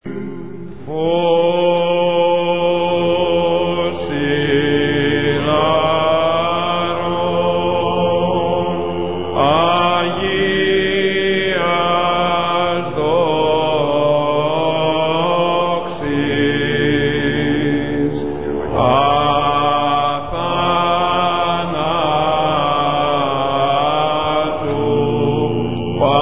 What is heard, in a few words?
Fos Ilaron Polyphonic; Archbishop Christodoulos